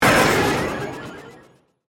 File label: Burst, Chopper, Digital, Production Element, Imaging Element, Accent, Transition; Chopper Sound Effects